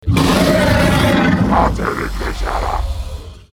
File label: Spell; Moloch